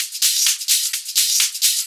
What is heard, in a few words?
Hihat Loop 128 BPM